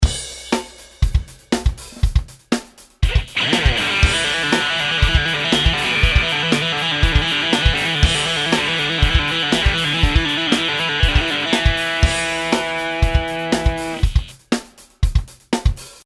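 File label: trill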